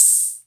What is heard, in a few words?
CR78 hat; DRUMMACHINES